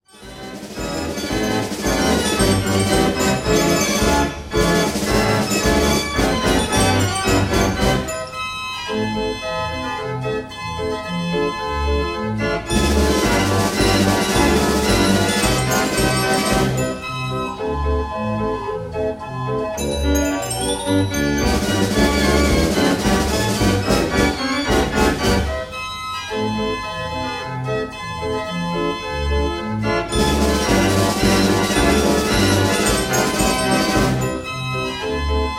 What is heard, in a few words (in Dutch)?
draaiorgel; concertorgel a ruth no36